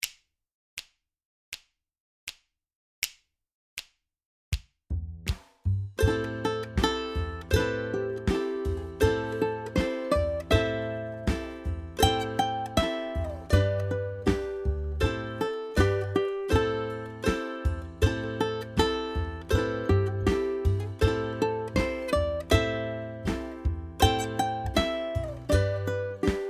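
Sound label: SHADY GROVE BACKING, ukulele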